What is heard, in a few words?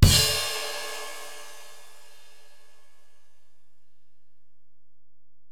Ride, Alesis DM-PRO, Drum Machines